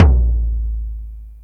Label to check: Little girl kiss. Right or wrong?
Wrong